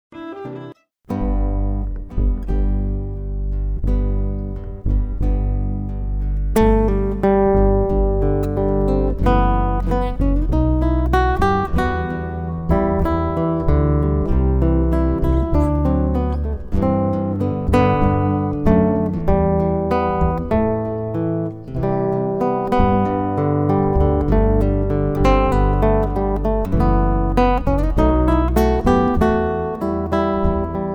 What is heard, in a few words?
Jazz Duo of Guitar; Lyrica Demo